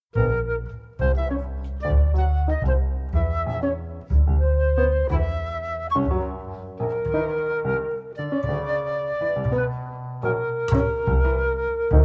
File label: pulse field trio excerpt